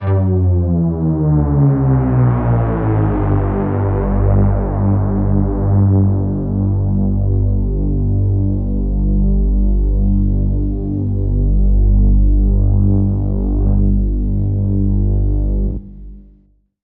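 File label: drone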